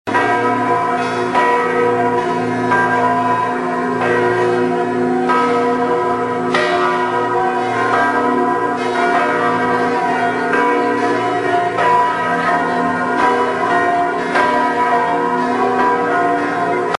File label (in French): cloches d'eglise